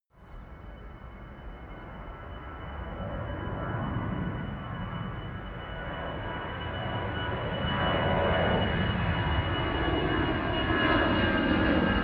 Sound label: plane flyby land tonal howl